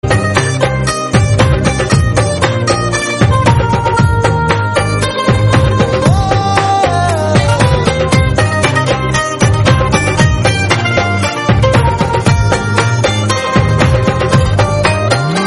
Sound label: romantic; gabbar singh